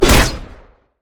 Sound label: bola strike; rengar